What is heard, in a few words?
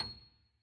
key; keySounds